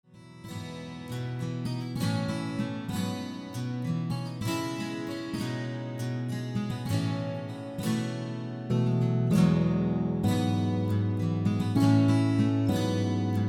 (Instrumental)

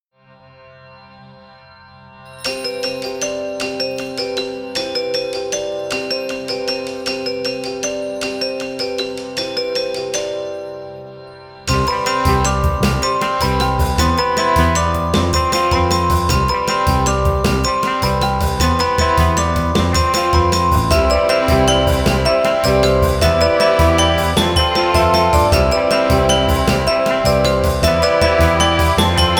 Harmonium Clicks

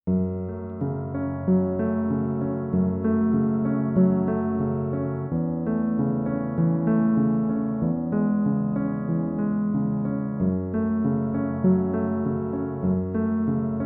Sub Piano; Richter

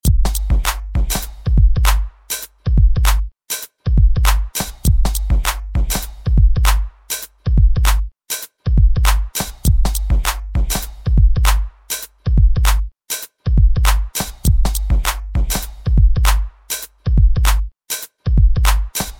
beat

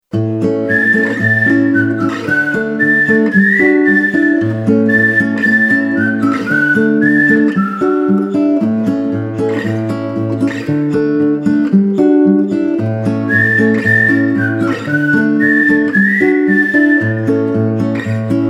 with Whistles

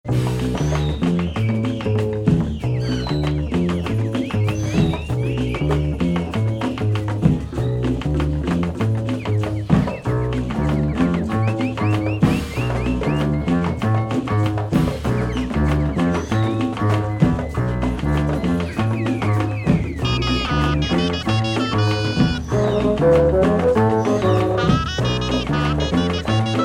Cumbia & Jazz Fusion

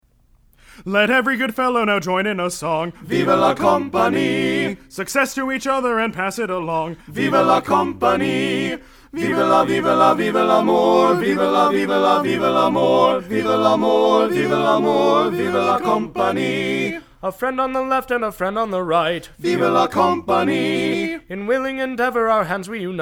Bass soft

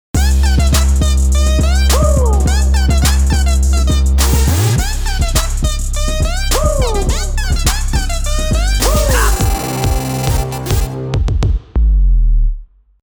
Electro Groove

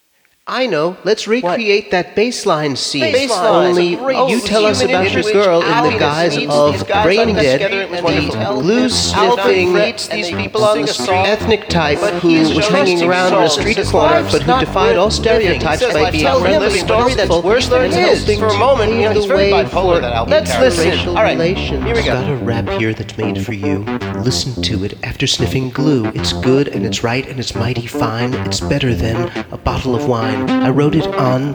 Bass Line

